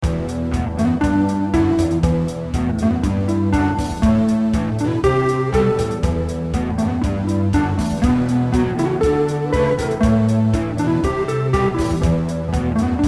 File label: Rock solo